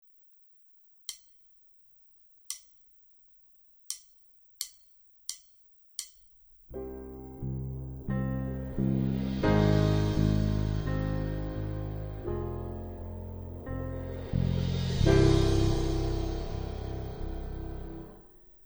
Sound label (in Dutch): Crescendo en Decrescendo - play along